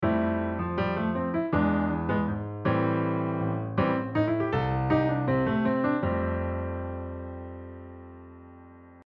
rhythm; oshare

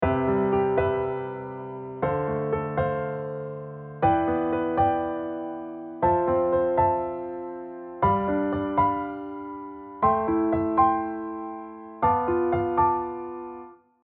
diatonic LH R5

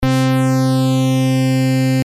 sub OSC

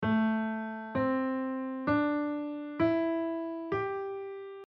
A minor penta

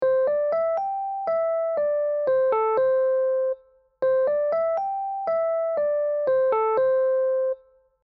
melody penta

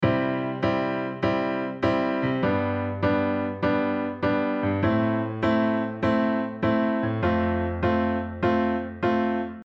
shuffle

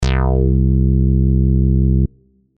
funk bass vcf

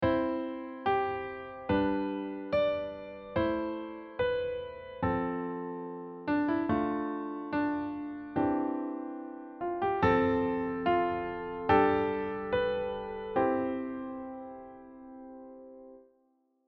diatonic chordtone

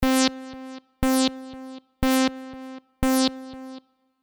delay FB

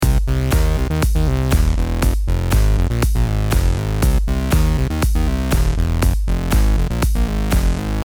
Synth Bass dance

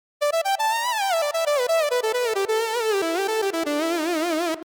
Main Lead

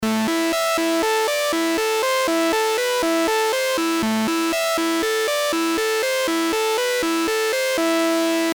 vib

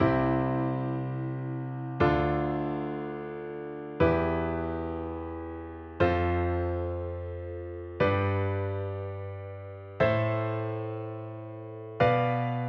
hikigatari chord

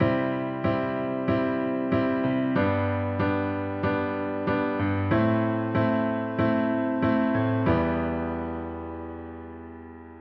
rhythm

